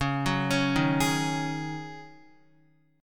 Db Suspended 2nd Suspended 4th Db, Guitar; arpeggiated; JGuitar

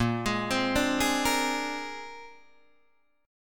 A sharp 9th A sharp, Guitar Open D

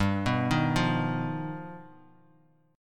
G Augmented 7th, Bass Standard; G+7 arpeggiated; 15,14,13,10; JGuitar

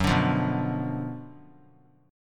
Gb Major 7th Suspended 4th Sharp 5th; GbM7sus4#5 strummed; JGuitar

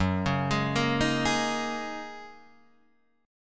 Gb 6th Add 9th Gb down Guitar Open D

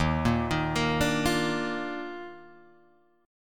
Eb Major 7th Suspended 2nd Eb Guitar Drop D

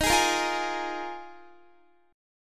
Eb Suspended 2nd Suspended 4th, Guitar; strummed; x,18,18,15,17,15; 4E,4A,5D,5G,5C,6F

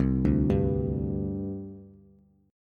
C Suspended 2nd C Bass; arpeggiated; JGuitar

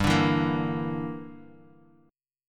E Minor 7th Flat 5th G

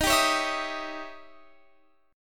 D sharp 7th Flat 9th Guitar Open F; D#7b9; strummed

